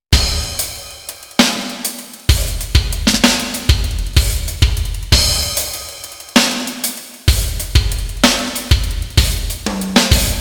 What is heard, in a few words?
verb test Rich Plate